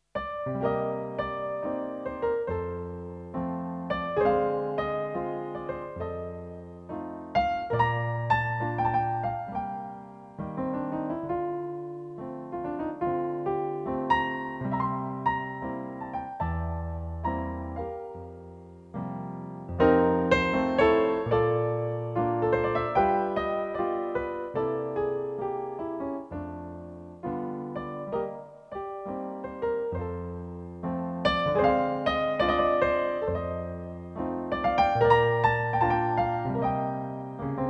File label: solo piano